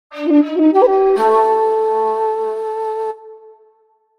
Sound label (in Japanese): 尺八